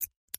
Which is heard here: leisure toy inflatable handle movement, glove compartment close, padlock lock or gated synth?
padlock lock